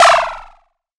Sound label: sound design discordant chime; zapsplat